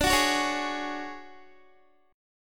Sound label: D Major 7th Flat 5th D down Guitar 4F 4A 5C 5G 5C 6E; strummed; JGuitar